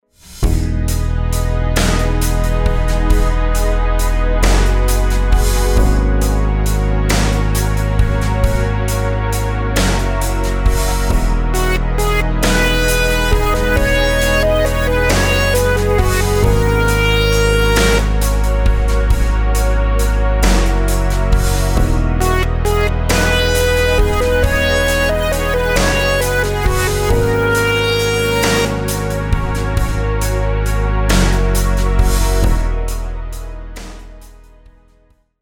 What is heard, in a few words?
rhythms compound 8s w16s